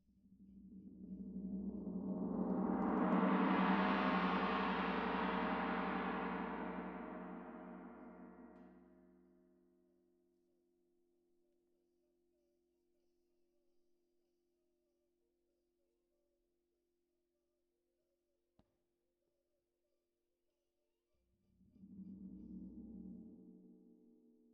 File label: Tam-Tam Close